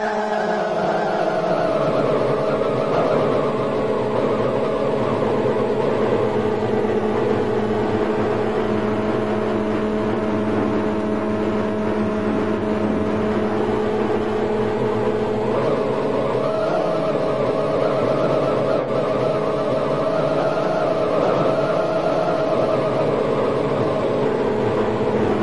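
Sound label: SEE sonified, SEE EUV spectral irradiance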